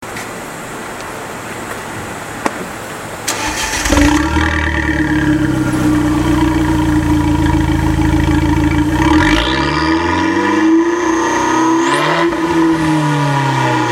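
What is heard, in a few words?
Giulietta mufler center blip, Alfa Romeo; unicorse-italial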